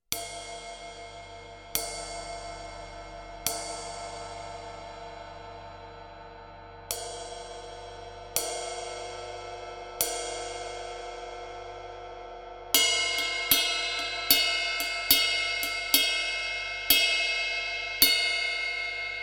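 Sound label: ride MB20 HEAVY 20, CYMBALES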